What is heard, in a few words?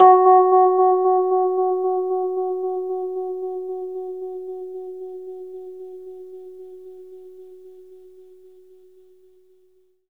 Rhodes fast F#; 3D Sound; 3D Keys VOL-1